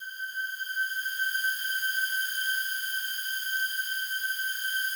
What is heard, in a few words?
slow strings G5, Yamaha VP-1; Music Store; 90's Sample CDs